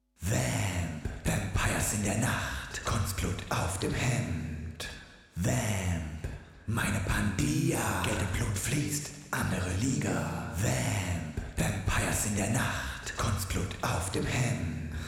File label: Choir; Tverb; VMPires; Hansa